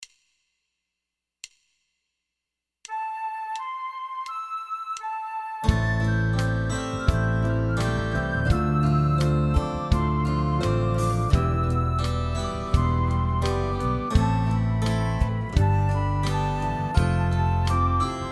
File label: BREATH Flute